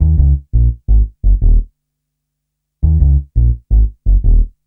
Upright Bass, Drum & Bass; Fluid Dynamics

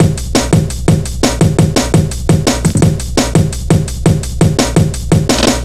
170, Drum Loops, Drum & Bass

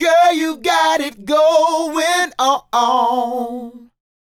Male Group, Hip-Hop and RnB Vocals

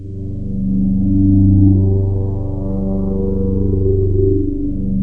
POLY-6; Korg

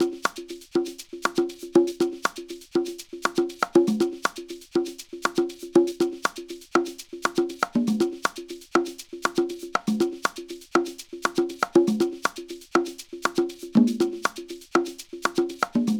120 Conga 8 bars, Nu Jazz House; PowerFX

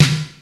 DDR30 SD; Crypton - DrumBase5000; RZ1

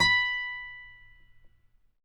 Finger B 5 L, Guitars; Spectrasonics; Hans Zimmer Guitars VOL-1

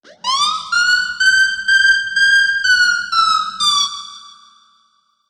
Verb Proc B, alarm siren, 125bpm; musicradar

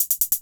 Cymbal; Sonic Foundry (Sony Creative Software); Trance NRG VOL-2; Drums; Cymbals

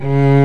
SOLO STRINGS

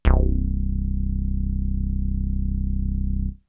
Bass; ZERO G - Datafile DISC 2

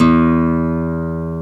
nylon E 2, guitar; AKAI S-Series CD-ROM Sound Library VOL-8

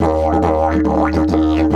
140, DIDGE RIFFS

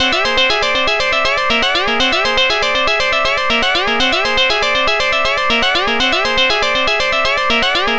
NRG Arp, trance

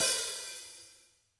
VINT KITS; Sonic Reality - Vintage Timetraveler

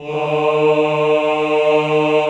CHO, Mix-Combi; Sound & Vision - Gigapack I CD 2 (Roland); Men-Combi

